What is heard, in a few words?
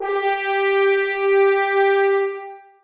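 horns sustain g4; Sonatina Symphonic Orchestra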